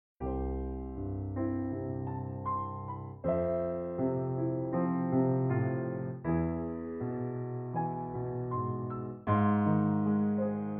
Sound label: Piano